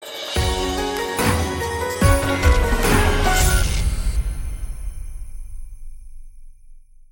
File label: Sweeper; jingles noel; Mac DO Jingles